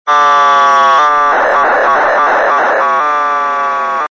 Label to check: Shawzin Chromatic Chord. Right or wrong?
Wrong